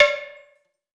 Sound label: percussion springbox; Percs